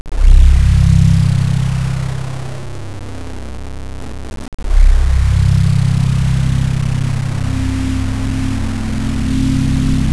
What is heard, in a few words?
amb